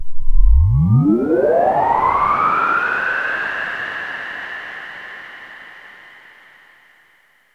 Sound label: SYNTH FX